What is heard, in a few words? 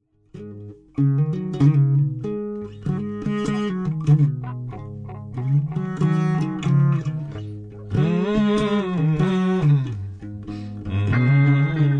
blues